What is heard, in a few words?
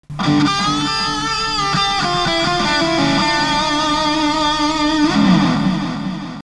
delay